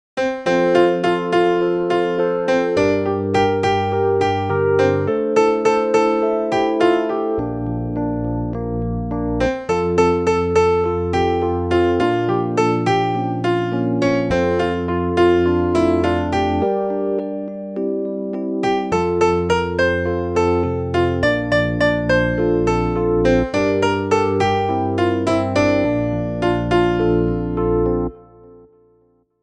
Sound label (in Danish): sopran; Du giver os dage